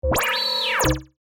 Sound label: Pulse Ray, Scifi; Battle (Sound Effects:Science Fiction); BlastWave